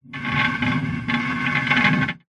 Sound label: foley castle dungeon door metal large heavy open